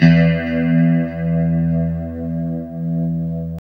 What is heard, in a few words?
LO-E E 2B -R, Guitars; Spectrasonics; Hans Zimmer Guitars VOL-1; JC-SHEEN